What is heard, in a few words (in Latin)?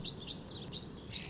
Nidiaceo Delichon urbica; Boltiere